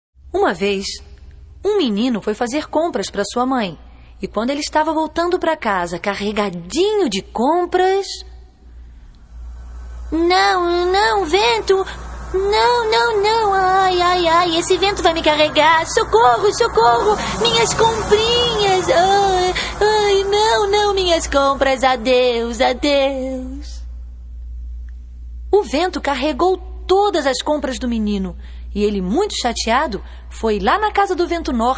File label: roar